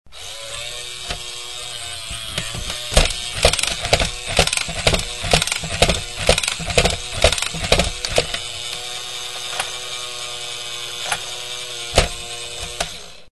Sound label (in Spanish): juguetes juguete